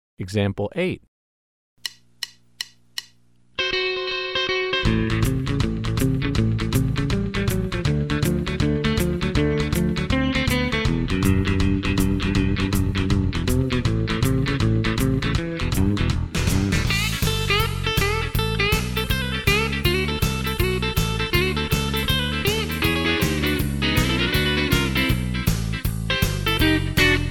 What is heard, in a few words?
Country Rock